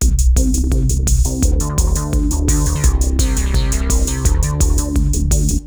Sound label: ACID, Tekkno LOOPS 170; Masterbits - Tekkno Trax